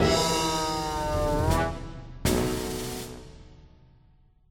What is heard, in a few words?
Fail; Trampoline Man; tintin reporter cigars of the pharaoh